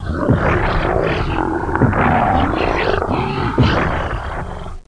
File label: elephant; CIV2; WARHAM